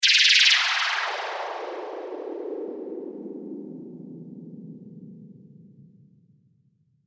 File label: sound design sweep descending airy futuristic; Sound Design-Airy Descent; McKinney Sound